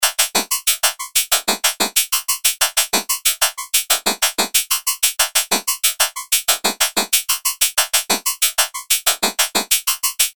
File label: beat staccato atonal seq